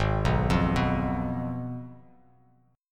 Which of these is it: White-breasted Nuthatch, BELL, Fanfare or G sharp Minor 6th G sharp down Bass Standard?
G sharp Minor 6th G sharp down Bass Standard